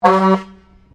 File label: Truck Kenworth 12V71 Horn Short, Old Kenworth truck; 1969; Trucks; Vehicles